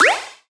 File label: Collectibles Sci Fi FX; Cymatics; Video Game Sample Pack Vol 1